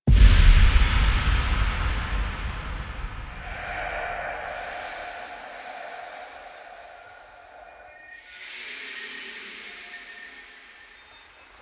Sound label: Intro Ambience; Warframe; The New War; Ballas Lotus Operator Intro